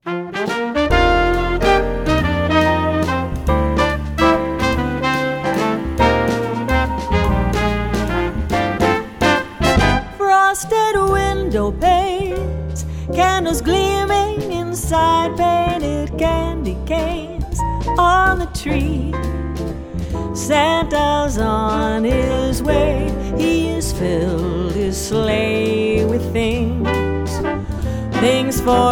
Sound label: hifi sample